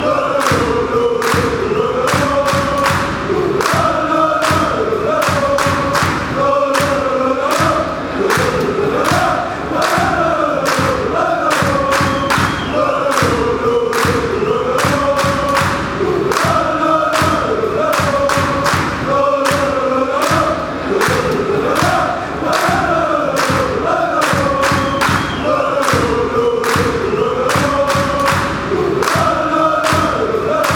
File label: fan chants; Real Sociedad de Fútbol is a Basque Country based team from the city of San Sebastián